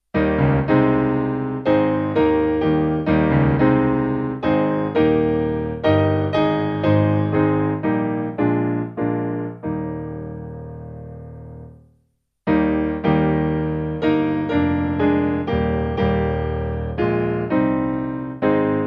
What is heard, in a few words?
Piano; Salzburg